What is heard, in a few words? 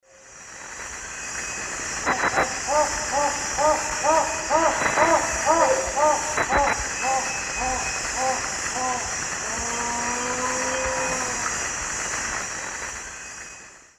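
Tigrisoma lineatum, song, birds; S. America; Eastern Lowlands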